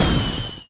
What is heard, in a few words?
tone; Fourth; seasonal